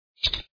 ADD GOLD

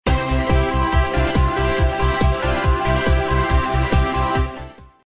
Music Total Ultra Win

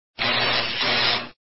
thunder

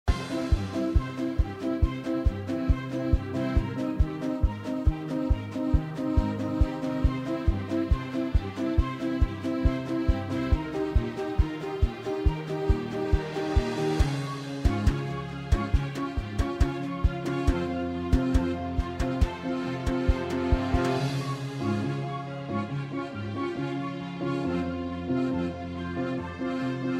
music

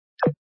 button

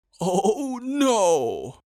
fish

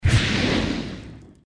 storm

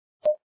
time alert

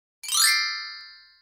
special Effect; pusoy

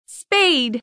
card spade

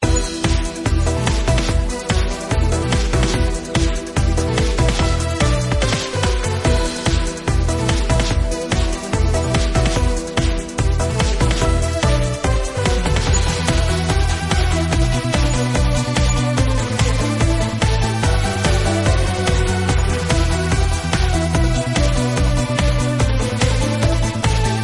free game

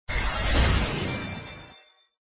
shoot

